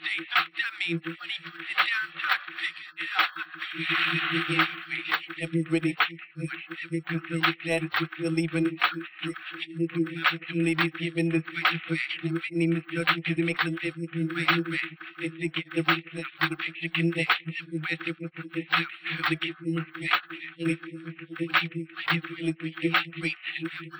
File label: claps; SiSEC11